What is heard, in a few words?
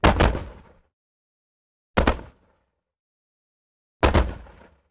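Mortars Firing Medium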